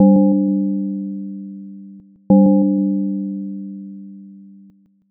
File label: gong clock